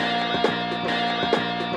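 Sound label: Guitar Note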